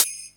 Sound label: HH, HIHAT HIP, Dance Mega Drums; 90 s Sample CDs; Best Service